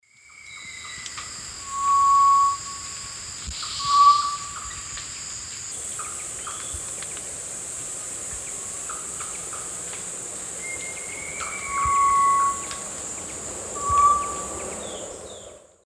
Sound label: Tinamus guttatus, song; S. America; Eastern Lowlands